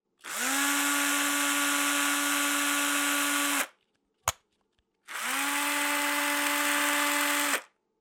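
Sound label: sound of screwdriver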